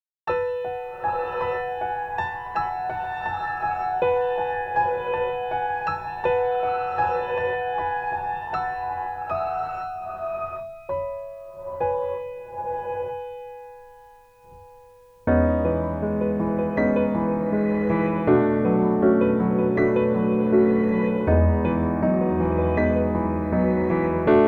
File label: spring instrumental